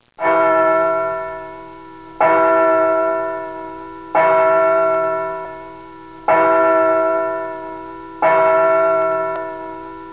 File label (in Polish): dzwon koscielny